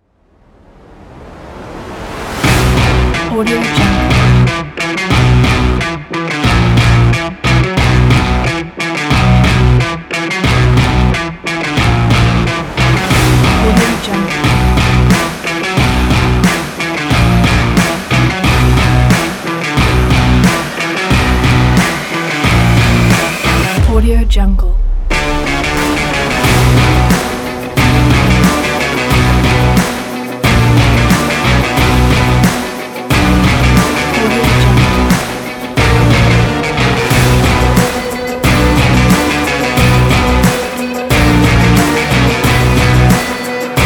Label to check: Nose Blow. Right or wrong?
Wrong